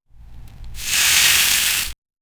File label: the harsh sound of a, fishing